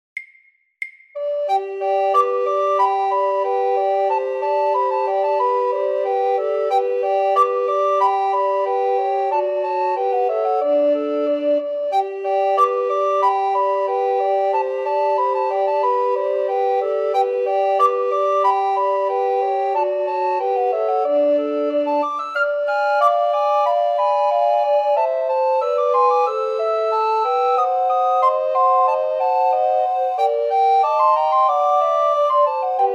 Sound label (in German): Suite fur Blockfloten